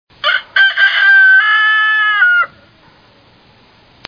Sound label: rooster ringtone